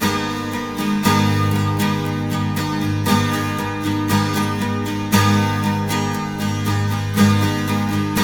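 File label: 12 string minor A, guitars; Spectrasonics; Hans Zimmer Guitars VOL-1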